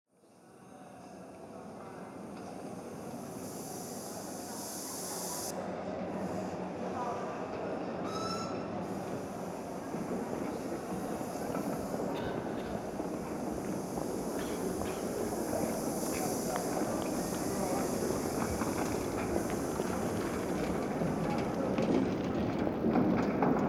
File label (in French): Voyage En Train Binaural; Truchet